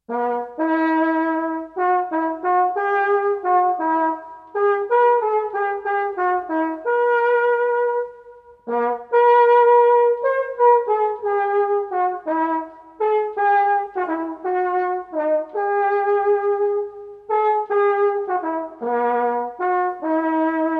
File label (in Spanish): TROMPA